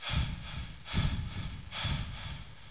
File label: BREATH